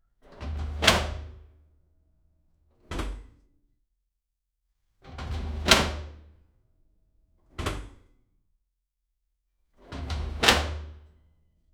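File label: loud closing of closet door; man made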